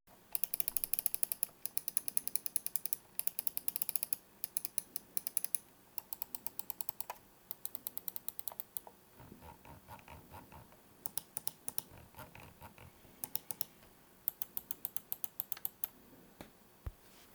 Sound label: roccat kain 120 aimo